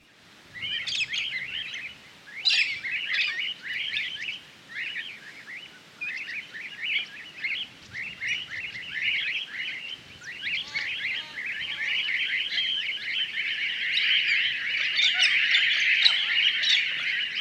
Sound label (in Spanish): Cacatua Ninfa